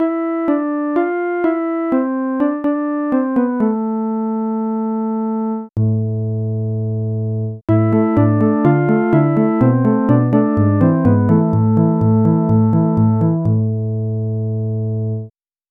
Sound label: synth bass